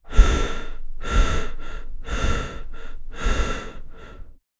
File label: heavy breathing